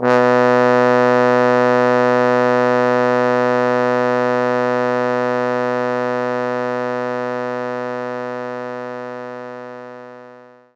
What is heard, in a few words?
Trombone B1; knights try